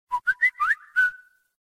whistle ringtone; whatsapp

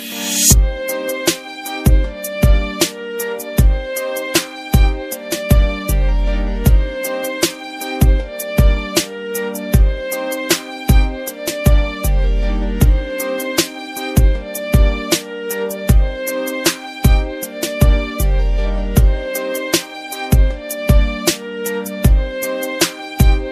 music ringtone

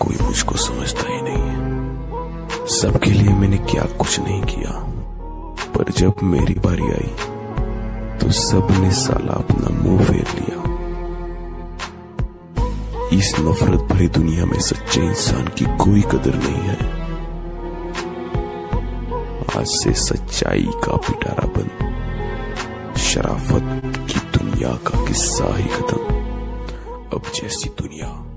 romantic sad music ringtone, bollywood

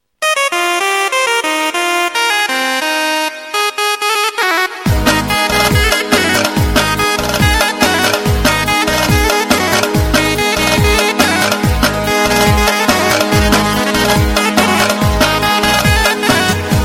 new Nokia remix ringtone

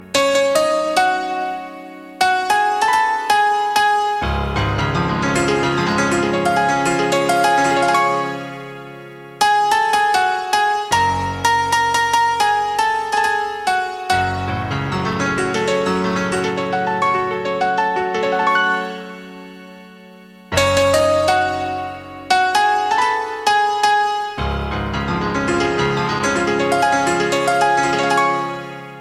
romantic flute ringtone; dilwale movie; bollywood

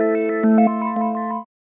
Nokia 8 edge sms ringtone

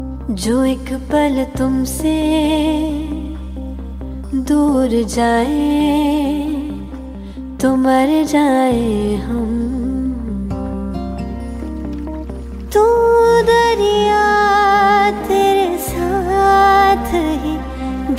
ringtone, bollywood ringtones

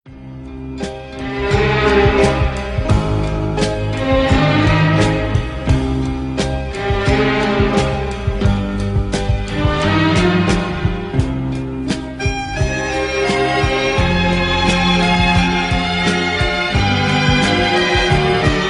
islamic tune ringtone

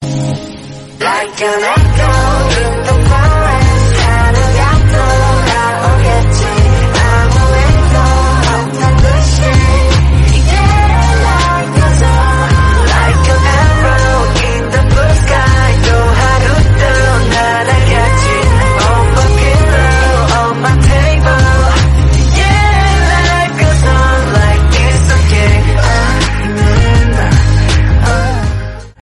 english song tone ringtone; english ringtones

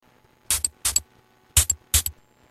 spraying perfume ringtone

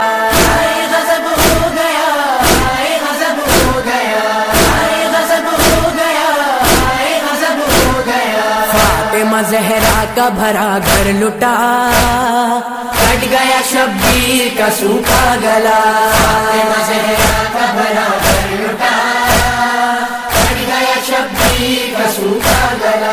noha tune ringtone

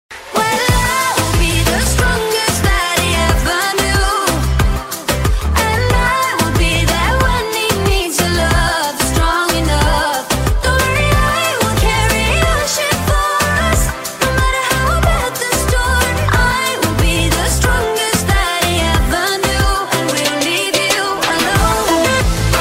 english ringtone

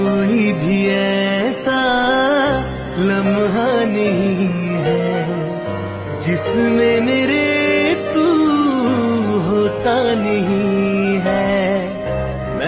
bollywood ringtone

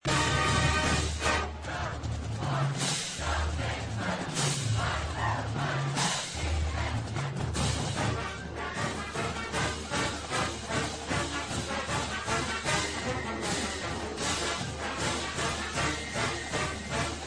fight song w lyrics; boise state

